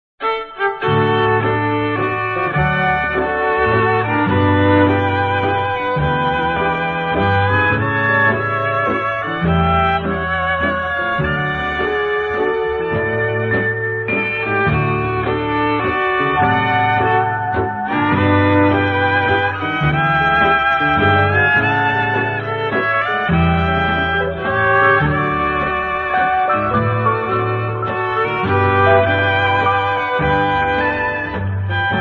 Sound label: Waltz